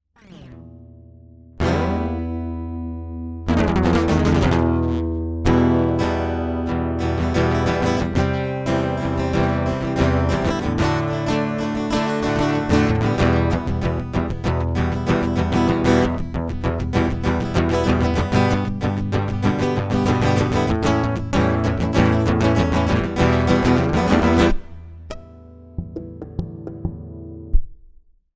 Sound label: Guitar; The Notes Are Flying